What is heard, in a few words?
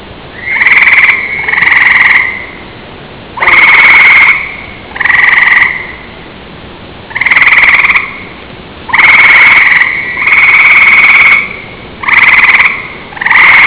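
northern gray frog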